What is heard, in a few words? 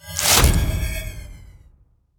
spider goddess cast spell